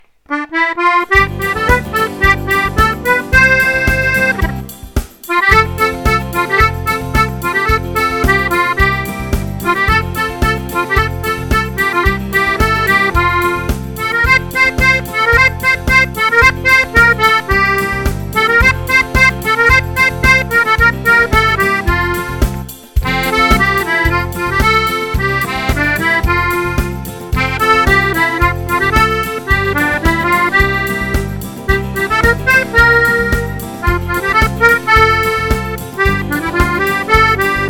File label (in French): country